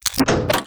turret ammo unload

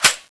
clip in; tfa cso; arx160

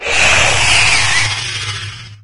imp death; doom3

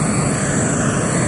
flight, revanent; doom3

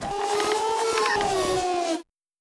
smelter display close

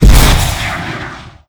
rocket launch; snds jack gmod

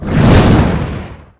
whoosh leg; gundyr

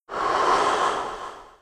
breath

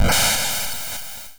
nes zap, zapper; weapons; neszapper

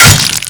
melee katana; l4d2; talos axe; weapons

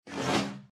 deathclaw swing; npc

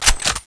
clip in; tfa cso; falcon ex

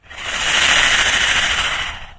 breath, imp; doom3

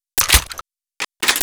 arc reload

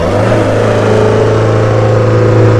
gear; alfa romeo

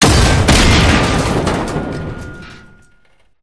gunstand destroy, turret; gow; turret judgement

